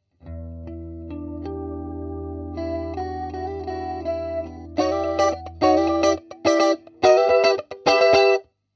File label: med light flanger